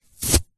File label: office string pull tight; zapsplat